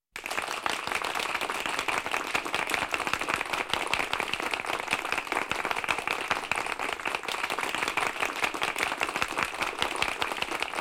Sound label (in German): Konzertstimmungen Applaus Von Mittelgrosser Menschenmenge